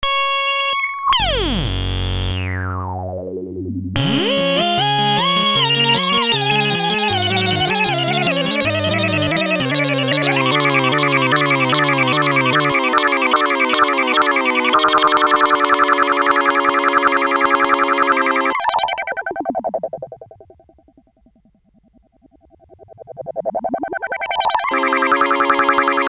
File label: Birds